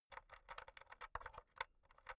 insert usb b rattle, elektronik; objekte

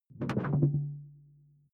sci-fi alien tech; swooshes-swishes

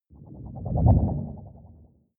whoosh storm wobble hard short

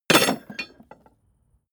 metal tools drop; soundpool

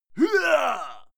male fight attack hit; soundpool

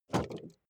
car bmw e46 exterior bonnet unlock

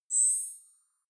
zoom in light; signale

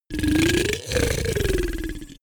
monster growl; koerpergeraeusche; comedy-cartoon; soundpool

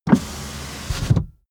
car window front close part

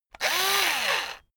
electric drill screw out short; soundpool

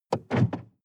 sports car int trunk open medium; verkehr transport; autos

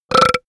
monster burp; comedy-cartoon; soundpool

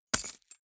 key ring large drop carpet medium; objekte; schluessel

